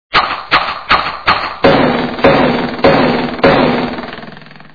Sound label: Arrows Four